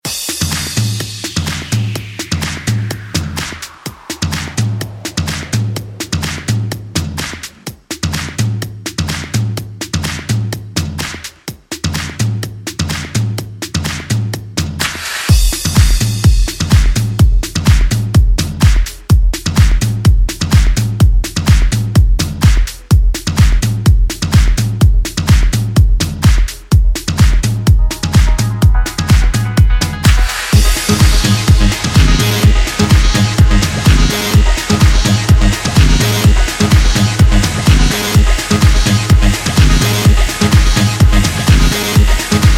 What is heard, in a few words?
Funk Remix